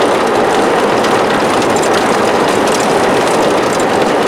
roller coaster track

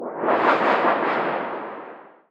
Hi Sheet Roll

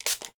Spray; Blastmorph Pinball; xbox series xs

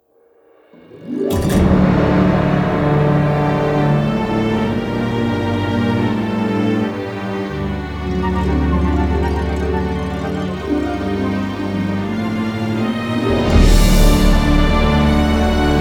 Spanish Travel Music; pirates of the burning sea game soundtrack 2007

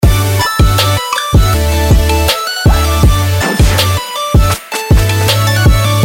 Boss Stage

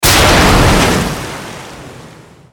Enemy Motorboat Hit react; Godzilla Smash3

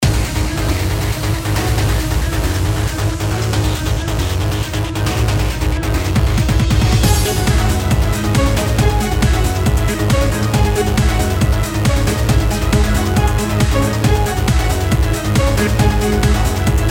Raid; Mist Train Girls

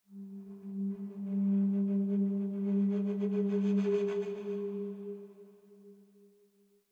Jingles STING; Semblance

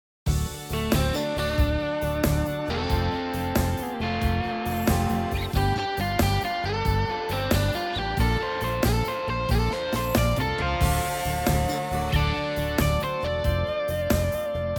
Rock Samp; Kaos

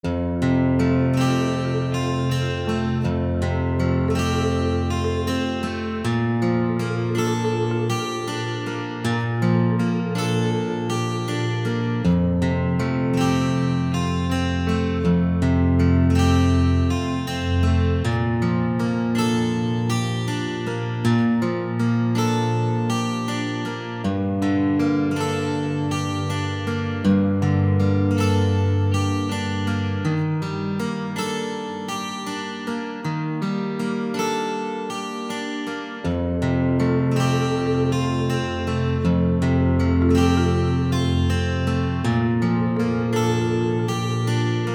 Moonlight Swim Melody off; Ocean Side

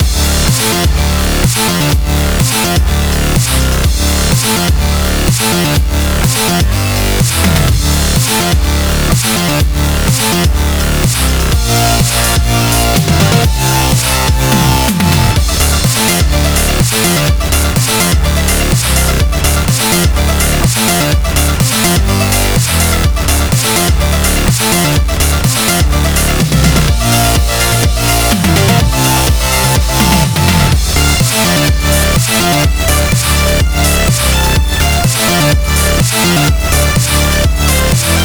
Boss level loop

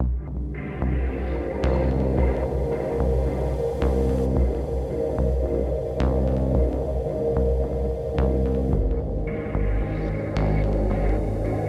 ending; Klang 2; klang2ending; safespaceendingedition

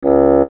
Sad Bleep; F.A.M.P.S

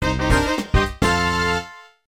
Cell Phone Surprise Jingle; Master of Illusion; ds

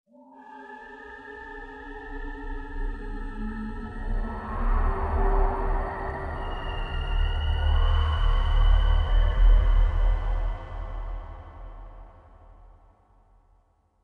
Horror; The Emerald Tablet windows gamerip 2019

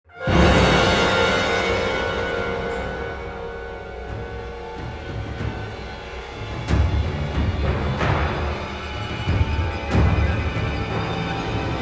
dialog danger

